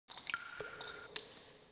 drop, CAVE; AMBIENT; Pirates Of The Caribbean

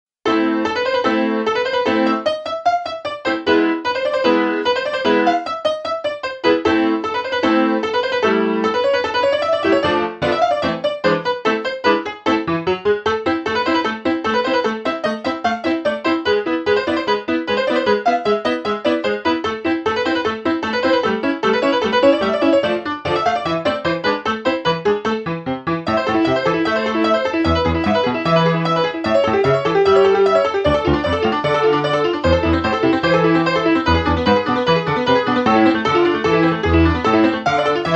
silent film; Test Drive Eve Of Destruction; XBOX

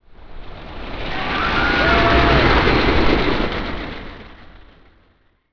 bg dist rollercoaster by; fun park; Tony Hawk's Project 8; XBOX

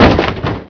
Crash; Chase Hollywood Stunt Driver; XBOX

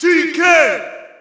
Shout, audience; UFC Tapout 2

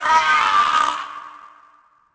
skeleton dead; voices; Pirates Of The Caribbean

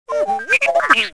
talk, r2d2

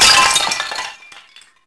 break vase; breakable

